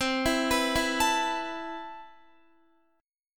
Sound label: C Major 13th, Guitar, chord; arpeggiated; chordshape